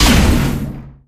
fast metal door

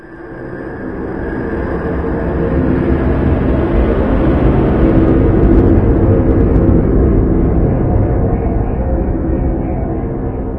spaceship; Source Engine; tf

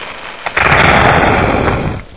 birth; video games; source engine; hl1; gonarch

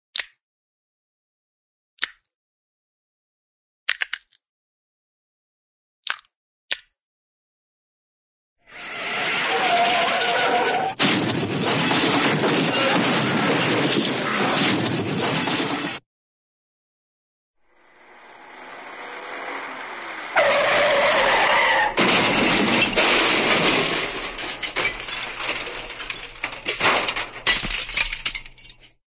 crack, nut walnut crack; cartoon

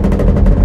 metal solid strain; Source Engine; video game; physics

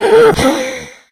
death, infected

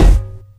physics cube

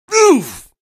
pain, barney; hl2; Source engine

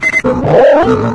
flank announce; hunter

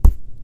ceiling tile impact soft, plaster; Source Engine; video game; physics

